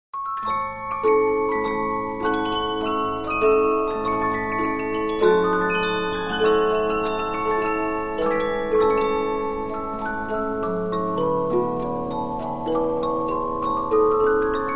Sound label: Polyphon, 15inch disc musicbox